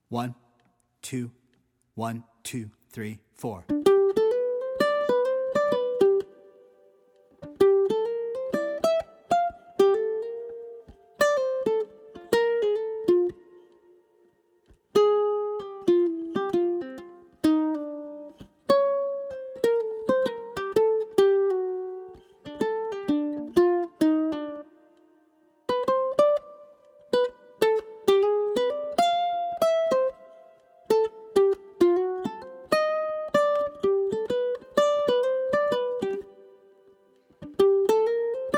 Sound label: uke